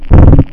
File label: Floor